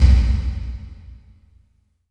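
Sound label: bass drum ROOM; pcm60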